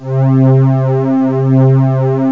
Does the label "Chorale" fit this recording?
No